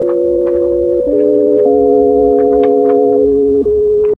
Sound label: The Ill Wind Organ; Movie Bits